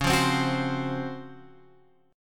C sharp Major 11th, chord; C#M11 Chord {9 8 10 11 X 8} strummed

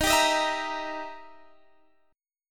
E Minor Major 7th Flat 5th, D sharp

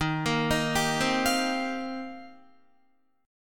Eb 6th Add 9th, Guitar; arpeggiated; 11,13,13,12,12,12

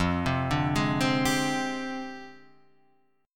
F Minor 6th Add 9th Guitar Drop D

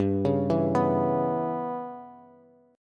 G-6th Flat 5th-G-15,16,14,16 down Bass; G6b5 arpeggiated

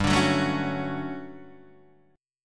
D-Major 7th G; DM7/G Chord {3 5 4 2 2 2} strummed

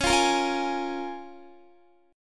Db 6th Db, down, Guitar; strummed

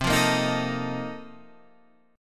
Bb Major 7th Suspended 2nd Suspended 4th

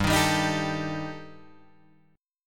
G Suspended 2nd Sharp 5th, Guitar Drop D

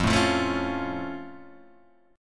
F Augmented

